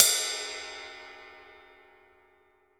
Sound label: 16 RIDE